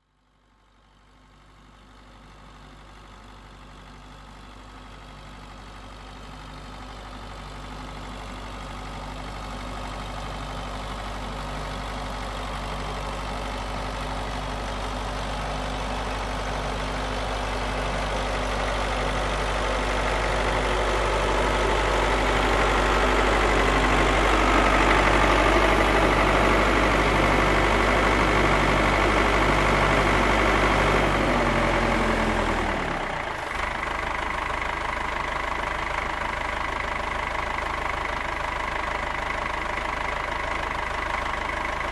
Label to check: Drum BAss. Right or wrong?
Wrong